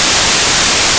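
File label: noise amplitude 0.8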